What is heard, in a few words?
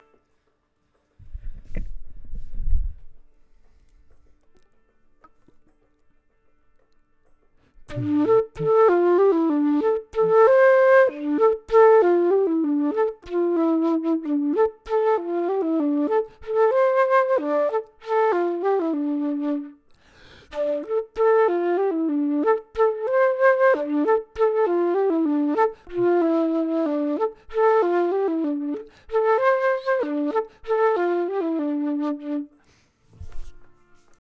flute